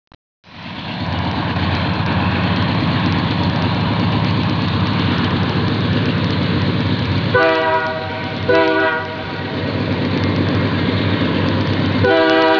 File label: dash9 apito